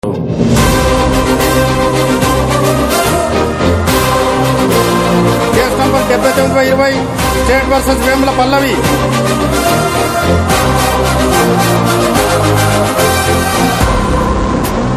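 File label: Trailer background music ringtone; PK; Vakeel Saab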